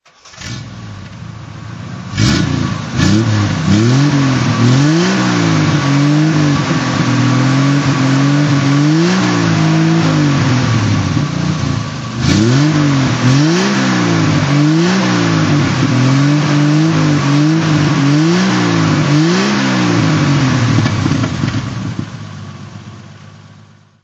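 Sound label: triumph tr6 su throttle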